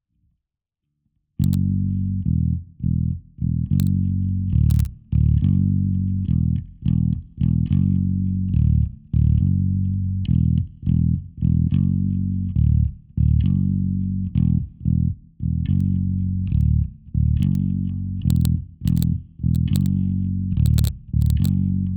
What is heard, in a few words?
Bass